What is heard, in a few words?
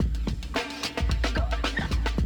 Classic DrumLoops, 110